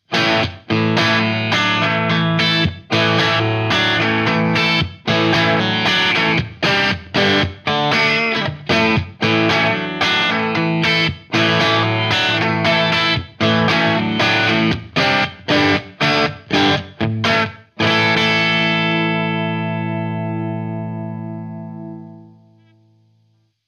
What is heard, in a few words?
Ampman Serie Light Crunch